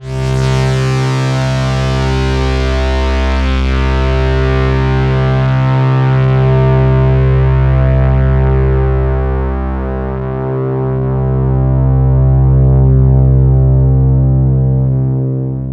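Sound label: strings C2, Oberheim OB-Xa Pads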